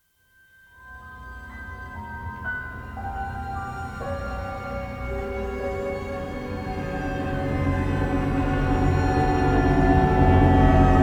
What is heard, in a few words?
Mood Nazi Creepy